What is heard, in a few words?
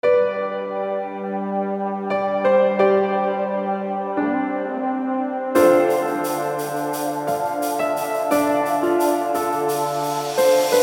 piano drum base test